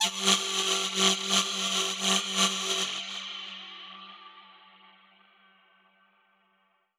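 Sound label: alarm siren, reverb processed, 170bpm; musicradar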